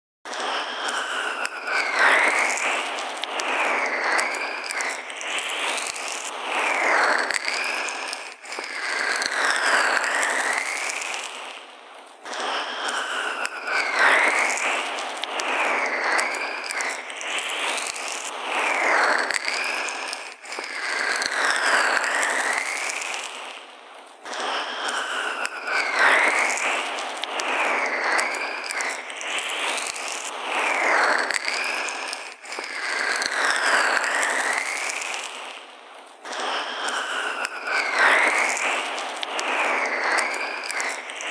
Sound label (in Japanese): まるで人の手のような柔らかさで、お耳全体を解きほぐす (右); ファンシー